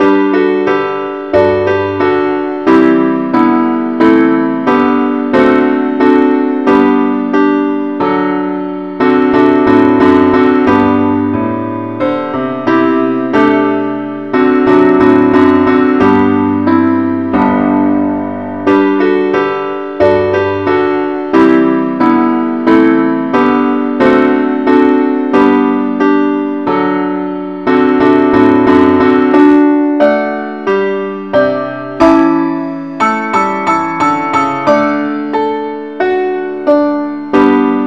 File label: keyboard Only